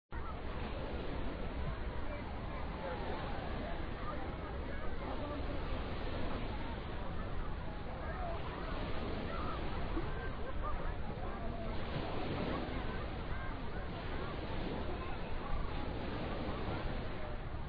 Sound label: ambience location